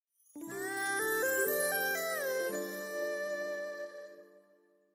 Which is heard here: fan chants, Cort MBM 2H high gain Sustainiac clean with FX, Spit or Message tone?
Message tone